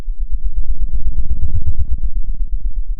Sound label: pop, Sound of a fire crackling